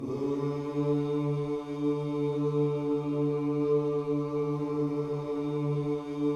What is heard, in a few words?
OOH D 3, Gospel; Spectrasonics Vocal Planet